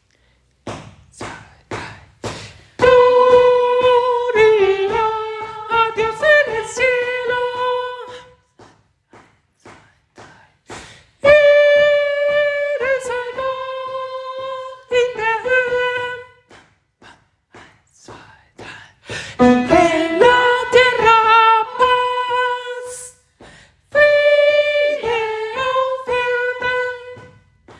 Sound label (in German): Gloria Sopran